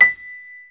B5 PIA; Northstar - New Gold VOL-2; BOS.GRAND

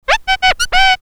TURNTABLES, Hip Hop

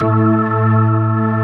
P-ORG A2, POWER ORGAN

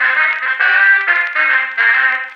Old Scratchy Sect, Effected Horn Section; Sonic Foundry (Sony Creative Software); Jazz Solos and Sections

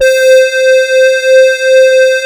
Poly800 C4, Korg; Back In Time Records; Korg Universe VOL-1

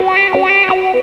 Guitar Lick; Zero-G Jungle Warfare 1, 2 & 3; Guitars

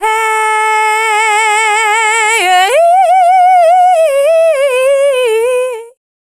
Dance Vocals, FEMALE

